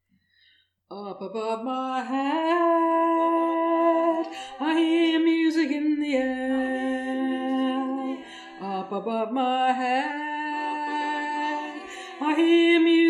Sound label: up above my head alto